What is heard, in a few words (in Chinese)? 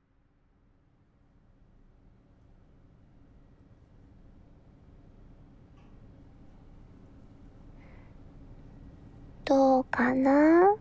在駕駛員休息室水聲作響地掏耳朵。（左耳）; 蓄音 萬岡